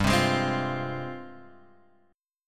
F Minor G, Fm/G chord; strummed; JGuitar

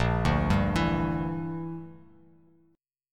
A sharp 6th Flat 5th, Bass Standard; A#6b5 arpeggiated; JGuitar

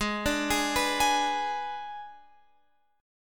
Ab Diminished Ab Guitar Open F; Abdim arpeggiated; JGuitar

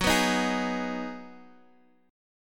G sharp Minor 7th F sharp

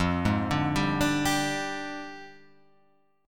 F Minor 6th Add 9th F down; JGuitar

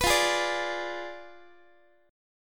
F Diminished 7th, Guitar; strummed; tuning 4A,4E,5A,5D,5G#,6C#

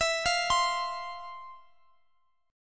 F 5th E, chord; F5/E arpeggiated

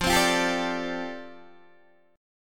F 6th Add 9th F down Guitar DADGAD, chord

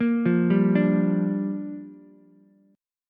G Minor 7th, Ukulele; Gm7 arpeggiated; JGuitar

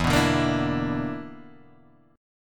B Minor Major 7th Double Flat 5th, chord

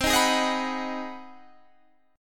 Ab 7th Sharp 9th, C

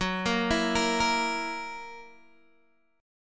F sharp 7th, Guitar, Open D; 16,13,14,16,13,x

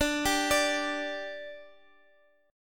G 5th D, chord

